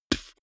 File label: beatbox bass drum dull